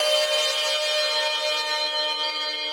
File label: synth main